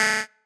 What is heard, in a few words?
marimba bike